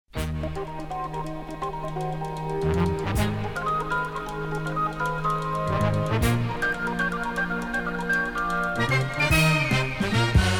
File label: LP 45 rpm